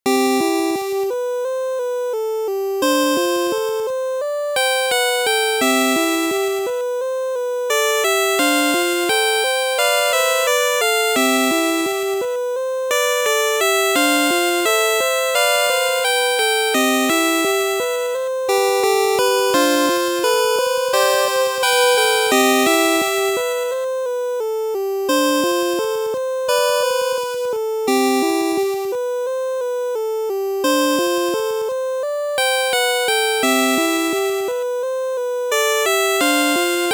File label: Pokégear Radio; Pokémon Crystal re-engineered soundtrack